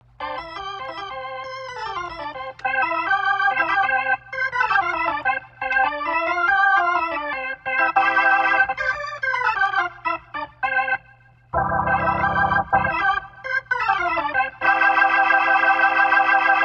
organ Treble Spin; Rotary Mod

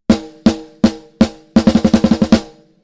snare Sharper Drier Snare; SplitEQ

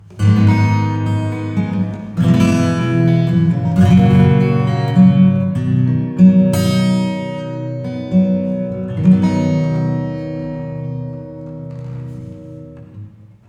Acoustic Reverb; Reverb Compare; TSAR-1

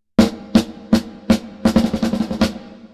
snare reverb De-reverb strong

